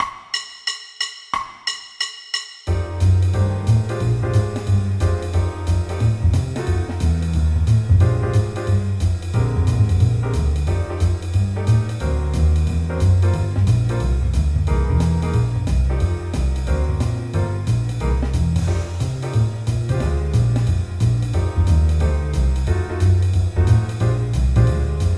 Play-along Blues in F (6 choruses, qn=180), Jazz Piano